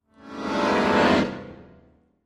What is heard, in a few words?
Accordion Bass Keys